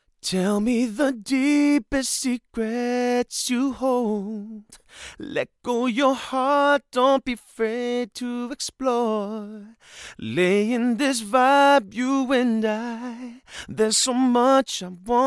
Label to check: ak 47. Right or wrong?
Wrong